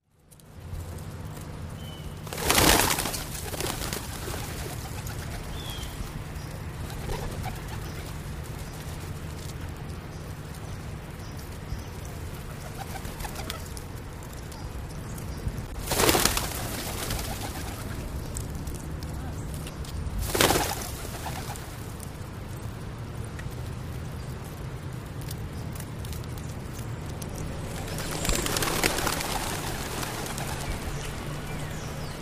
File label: City Park. Most Pigeon Activity In First; 50 Of Track, Then Continuation Of Park Ambience; Variety Of Birds Chirp And Squawk, Distant Traffic Roar.